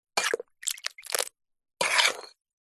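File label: food cereal in bowl with milk scoop spoon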